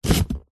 cardboard shoebox empty pick up; zapsplat

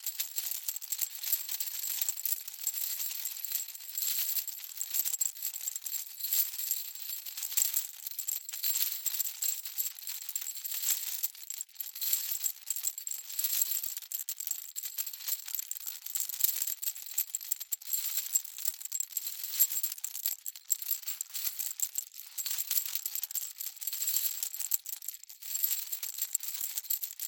Chains Rattling; Just Chains; soundbits

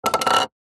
wood stick hollow bamboo drop wood surface; foley; zapsplat

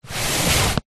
card box product small slide table

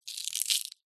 animals insect cockroach crush squash crunch

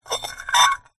foley metal sharp edge scrape on metal pan squeak

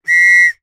scout whistle blow; ACME

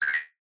science fiction robot chirp beep metallic short

